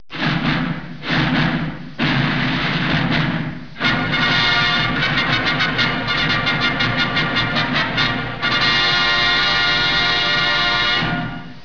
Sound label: Fanfare